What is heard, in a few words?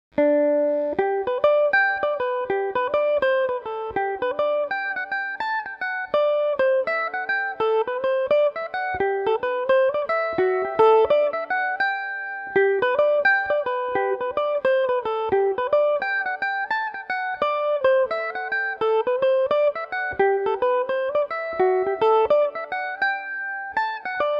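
G major revelation, irish mandolin; The Meltdown; Revelation Jaguar electric mandolin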